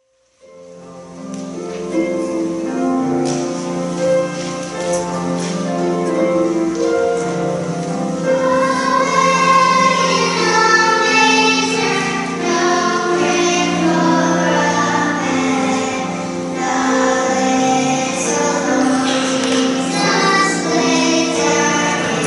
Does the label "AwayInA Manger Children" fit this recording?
Yes